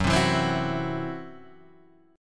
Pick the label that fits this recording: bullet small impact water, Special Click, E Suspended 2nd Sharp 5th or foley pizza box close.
E Suspended 2nd Sharp 5th